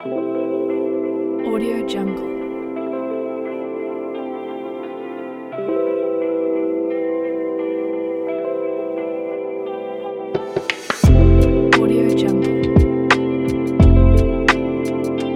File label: lofi